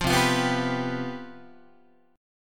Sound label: C Major 7th Suspended 4th, C, down, Guitar; CM7sus4 strummed